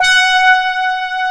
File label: HR G5, ANA HRN